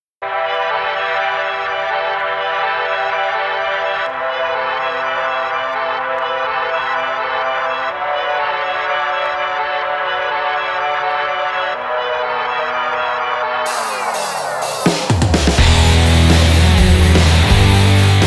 off vocal version

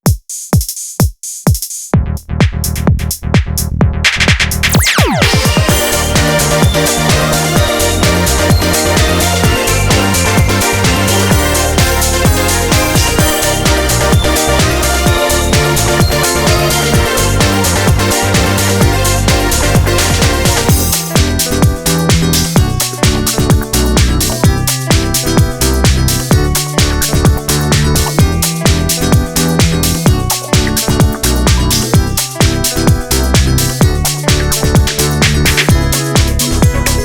instrumental

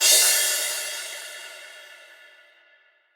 Cymbal, PsyTrance

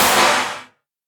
Snare, PsyTrance

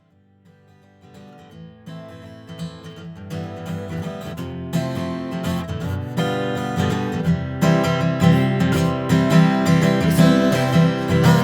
acoustic ver.

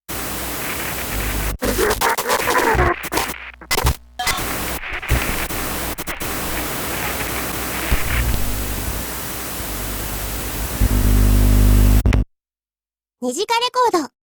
Tuning; Live performance in Shanghai

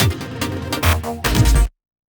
FILL, PsyTrance

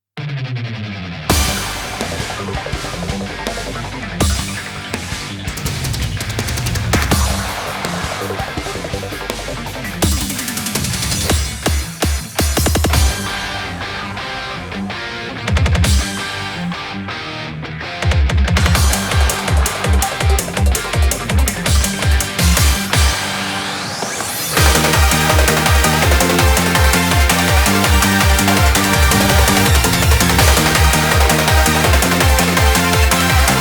Instrumental

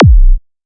Kick; LiLA'c Records PsyTrance Sounds 01

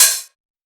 Open HH, PsyTrance; Cymbal

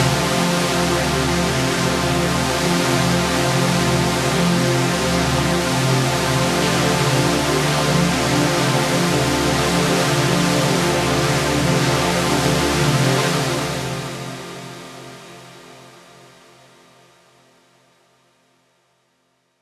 Pad, PsyTrance

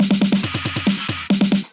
Percussion Fill

